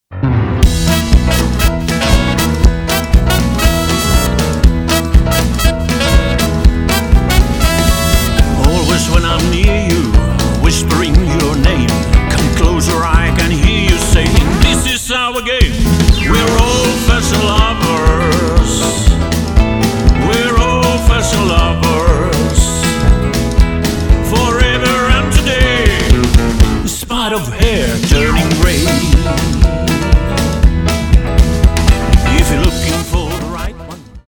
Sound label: Blues and Rock